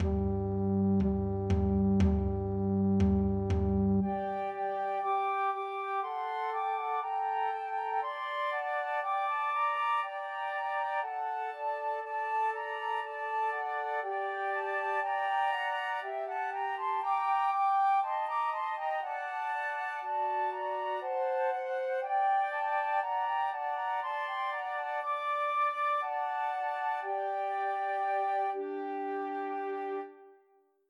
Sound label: Trios for Three Flutes; Pat a Pan; Christmas